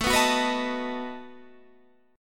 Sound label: A 6th Suspended 2nd Sharp 11th, guitar